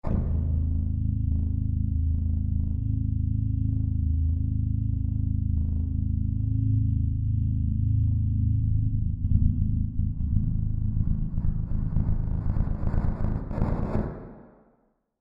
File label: Hovercraft SciFi Stalls at End, Hovercraft Start Up Then Stalls; Mechanical Futuristic High - Tech Scifi Science Fiction; Choppers; Sound Design